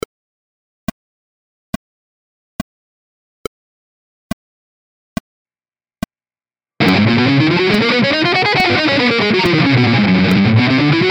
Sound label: scales rhythmic variation